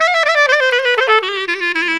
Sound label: CLARI GROOVE; Best Service; World Colours